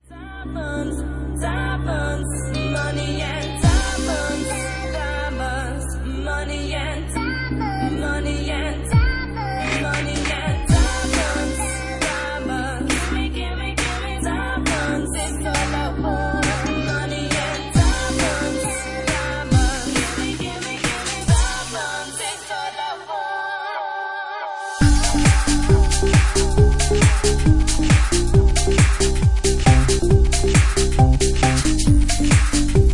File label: Organ Remix, Bassline House; 136 bpm